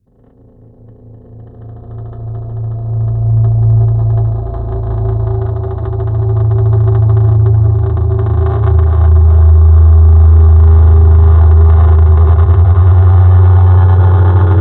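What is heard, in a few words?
ghost ship, pads; flaechen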